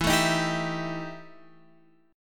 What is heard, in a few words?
E Minor Major 7th Double Flat 5th Guitar Open F, EmM7bb5 chord; strummed; JGuitar